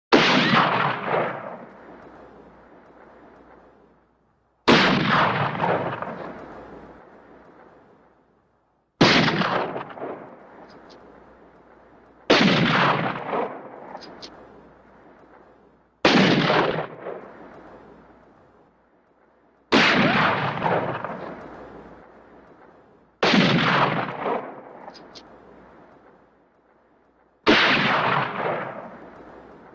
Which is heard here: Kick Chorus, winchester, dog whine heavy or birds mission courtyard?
winchester